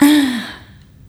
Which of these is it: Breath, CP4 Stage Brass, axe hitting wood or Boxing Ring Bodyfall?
Breath